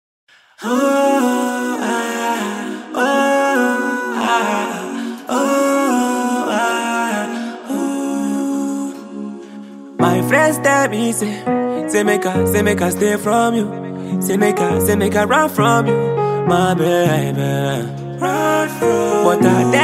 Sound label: Acoustic Version